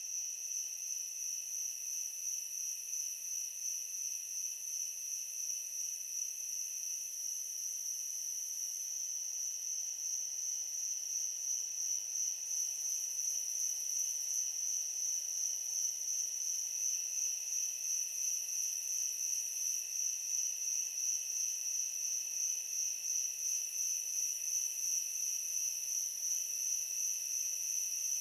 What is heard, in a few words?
Summer Night Loop; UltimateFishing